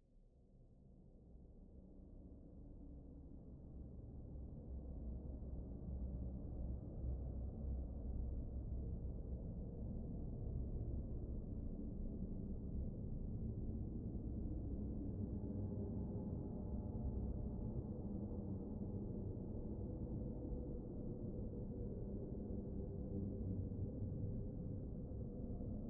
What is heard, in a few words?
haunting ambience